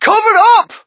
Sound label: crowd, shout; Mike Tyson Heavyweight Boxing